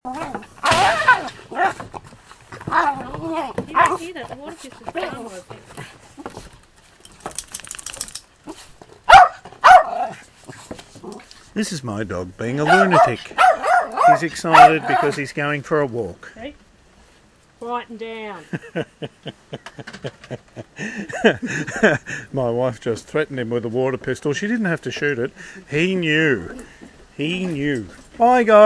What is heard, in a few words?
Woofy Dog